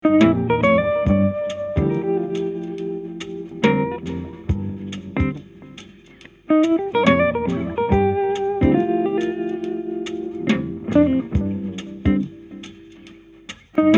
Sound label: jazzy loop